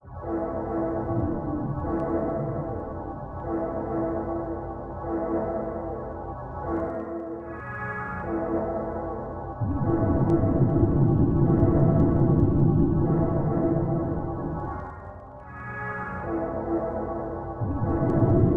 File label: The Violence District B - Ambient